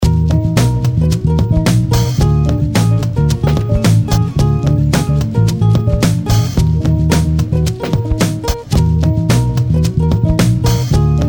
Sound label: Ukulele